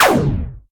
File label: virus laser; Dont Get A Virus